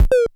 KLONE ODD, Klone Dual-Percussion-Synthesiser, PERC; KLONE PERC NW8